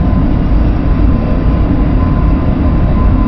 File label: dropship engine; Quake 4 Alpha; vehicles dropship